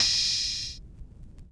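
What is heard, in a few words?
Vinyl Open Hat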